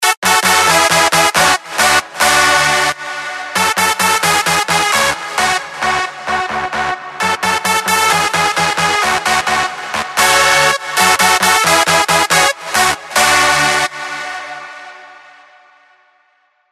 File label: CLASSIC LEAD